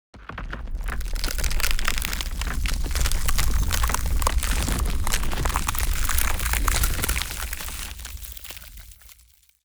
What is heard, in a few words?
surface frozen